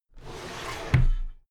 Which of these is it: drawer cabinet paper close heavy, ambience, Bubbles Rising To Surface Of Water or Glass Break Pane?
drawer cabinet paper close heavy